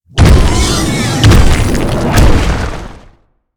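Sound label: boss spawn; tolerant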